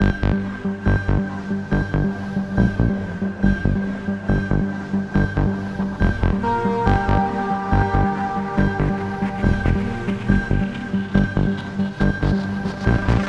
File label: music combat low; Spacebase Startopia